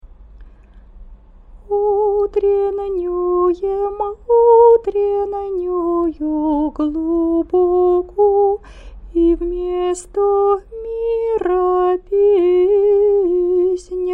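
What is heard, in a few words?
easter canon byzantine hirmos slower, singing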